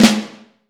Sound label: Vintage Snare Drum; 5X14; Q Up Arts; Johnny.C's Vintage Snare Drum